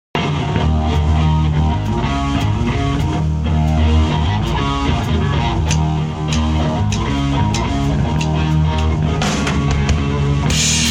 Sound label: Instrumental - Live In London